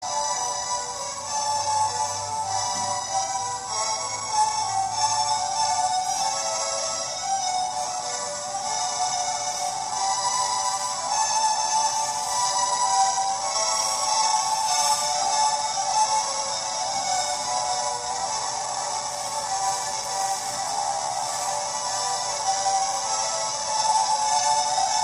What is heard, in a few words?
canon d